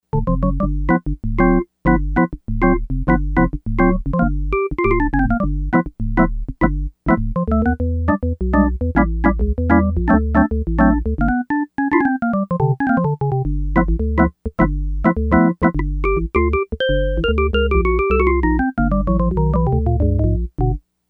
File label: Jazz Organ, Yamaha DX100; Factory Patches